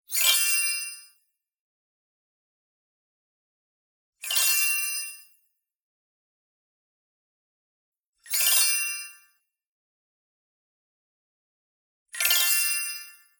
bling, tonal color rise; boom library; Anime Essentials; DSGNMisc

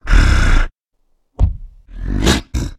stomping rooting; blindside

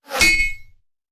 Bullet Impact; Sound Ideas; Guns

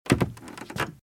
Footsteps - Wood - Creaky - Shuffle; RadwinIsland

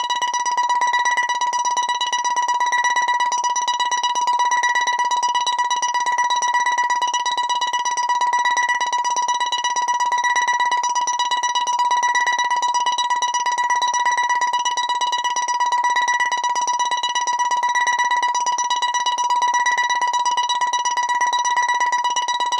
Left Warning; pong; Pitch Black Audio Pong

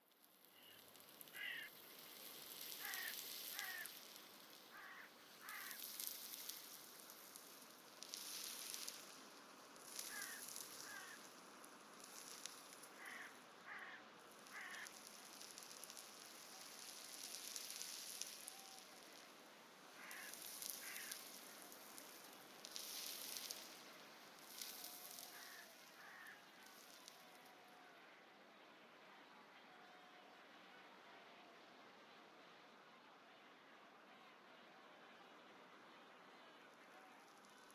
AMB Desert; BLIND QUEST - The Enchanted Castle

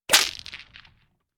Bullwhip; horror sounds; Hollywood Edge

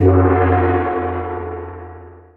Pickup Secret

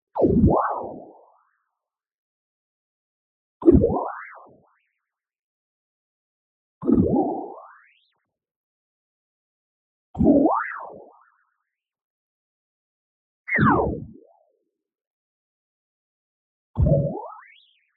tonal, filter lowpass modulation; boom library; Anime Essentials

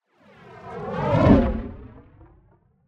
Space Ship By - Fast Pulsating Engine; Hollywood Edge; sound designer's toolkit v2

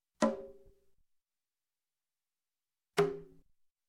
Drums, Conga single Hit, Music, Percussion; Sound Ideas; Series 2000

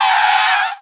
Tire Screech; Automobiles; 1001 Sound Effects

